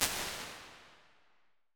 Large & Brite; Large Spaces; Rooms; Impulse Responses; Apple

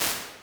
Music Club, Impulse Responses; Small Spaces; Indoor Spaces; Apple

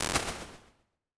Diffusion, impulse responses, warped reverb; Small Spaces; Apple

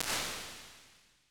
2.0s Club, Impulse Responses; Medium Spaces; Indoor Spaces; Apple

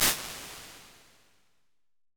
First Row Seat, Impulse Responses; Medium Spaces; Indoor Spaces; Apple

